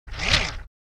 loader jump; coolmathgames

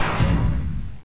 crash; madcars2; radicalplay

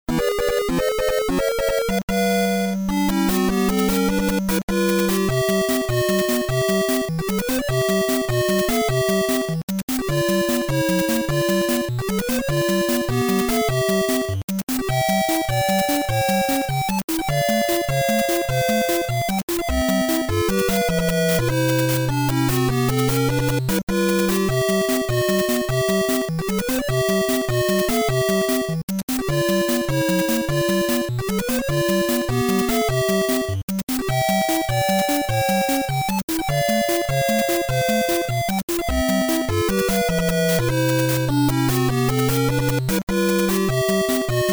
Hurry Underwater

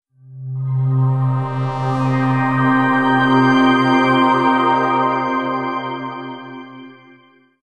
transition heavenly

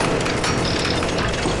nom; coolmathgames; 60 second santa run

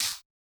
hit light; battle; CrossCode

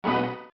combo; Puffer Popper

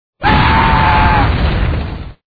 death fire; demolition 2

